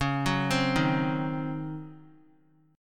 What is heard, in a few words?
C sharp Major 7th Suspended 2nd Suspended 4th, C sharp, chord; arpeggiated